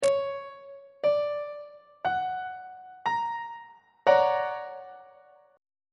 c double sharp augmented major 7th 3rd inversion on treble clef